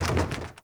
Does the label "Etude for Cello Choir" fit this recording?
No